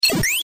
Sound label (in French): bloc; ChasseAuDragon